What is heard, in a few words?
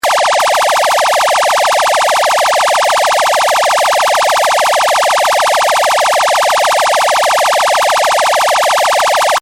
xcel hyper yelp